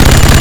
minigun fire

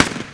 apc fire; gdi

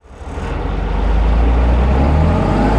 marder start sound

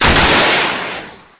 missile tow fire

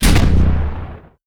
hunter fire; gdi

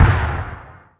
airburst explosion

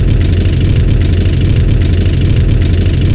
tick loop

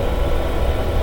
attack bike loop

